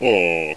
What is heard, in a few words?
Awwww; Eric's Solitaire Sampler